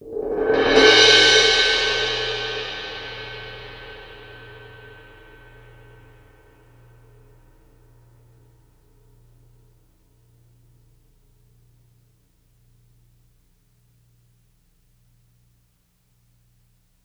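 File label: c suspended cymbal short roll to crash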